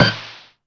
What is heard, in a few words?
TECHNOID